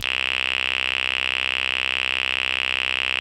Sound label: SQUEEK, LEADS; Masterbits; Vintage Voltage